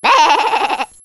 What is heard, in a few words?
Baa